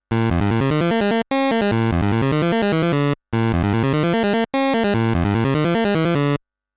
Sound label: Challenging Stage Start MUSIC; Namco Classic Collection Vol.2; arcade soundtrack